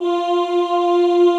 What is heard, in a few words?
OOH F3, Classical Choir; Best Service; Extended Classical Choir